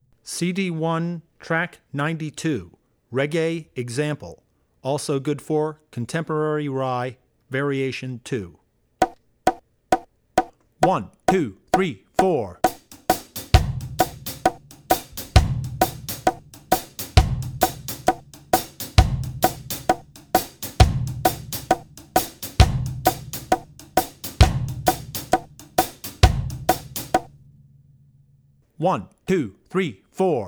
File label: Reggae; Drummer's Bible Tracks